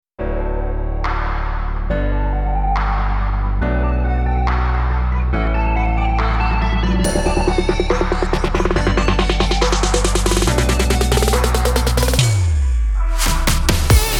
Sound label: music upbeat exciting vlog background intro theme